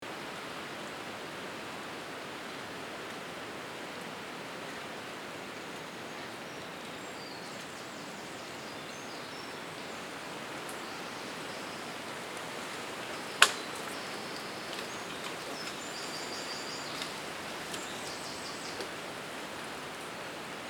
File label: Wind in the trees sound effect; bigsoundbank